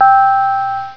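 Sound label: Chime High; Instrmnt; Sound Library 2000; Wayzata Technology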